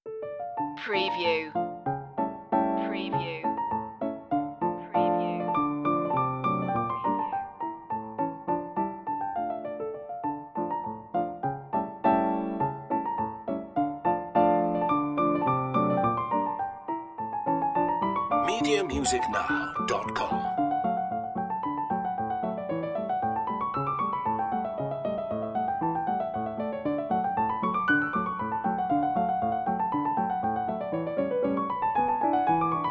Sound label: italian polka piano; rachmaninoff